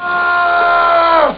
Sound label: screeeems; Amiga Soundtracker Sample Packs